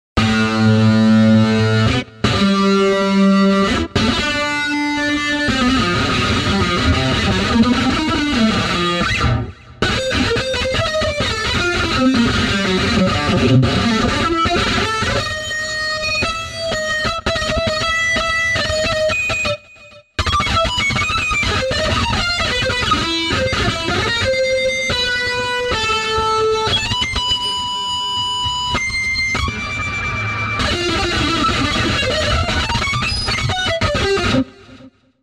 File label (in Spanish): SOLO GUITARRA; Guitarra hell solo 1